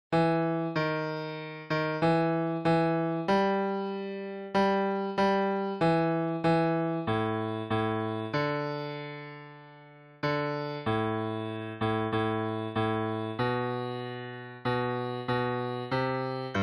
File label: Bass